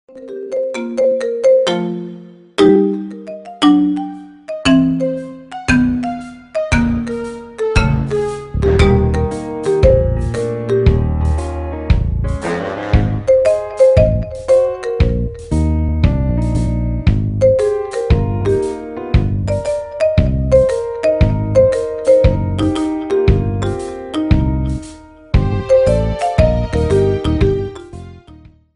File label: marimba ringtone remix; toy story theme